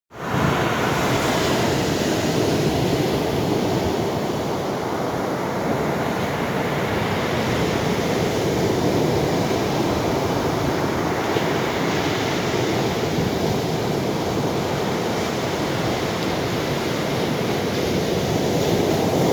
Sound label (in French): Vagues ocean agite